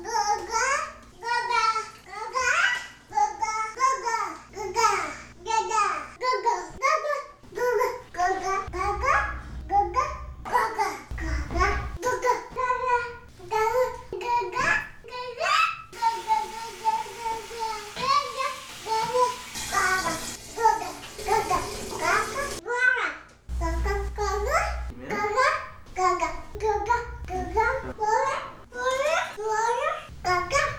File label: water